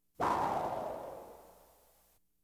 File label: Science Fiction effects, Lasers, Light beams